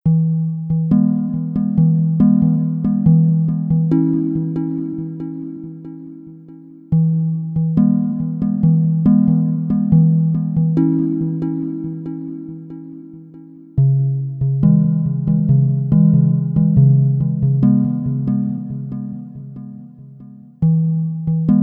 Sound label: magic music ambient 432 hz; magic style